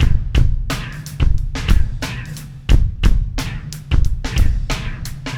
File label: Rap FX, Drums; ACID MUSIC